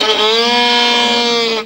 VIO MISC, MISC VIOLINS

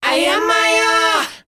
KIDS, World Voices, S.AFRICAN; ILIO; Vocal Planet

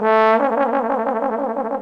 TROM DOIKS

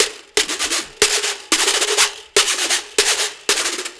Scraper, Percussion Loops; Ambient Grooves

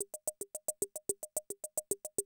CR78, DrumLoops

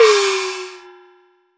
HuYinLuo Hi Open, Gongs; Roland BK-5; Percussion; Cymbals

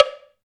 DM5 Perc, Alesis DM5 drum machine

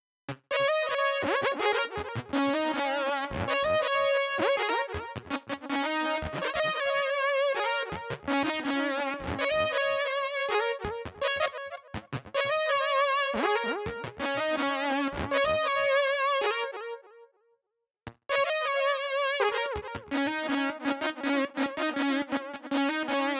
synth, Smooth RnB, Ebmin; Big Fish Audio; Legendary; Happiness

